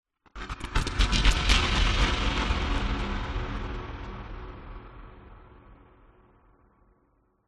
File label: sound design cinematic sparking droning stutter sweep